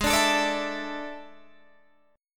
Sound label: E 11th Ab